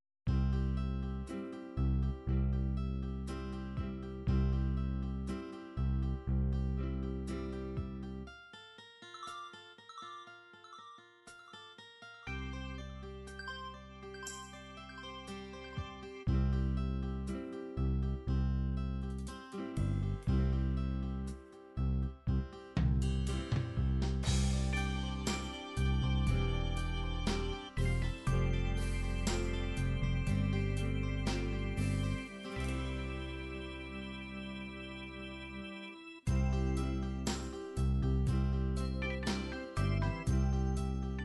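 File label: jazz big band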